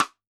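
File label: Snare Stick; drum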